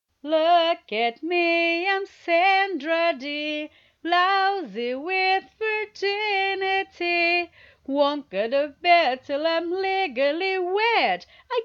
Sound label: A capella, Sing; F4A; Grease; Rizzo